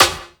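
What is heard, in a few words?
snare drum, Drum & Bass; Ueberschall; Drum & Bass Resonance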